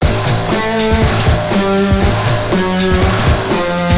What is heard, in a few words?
FANFARE